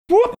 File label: making funny sound